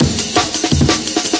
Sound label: 170 hard amen, drum loop; 161 to 180 bpm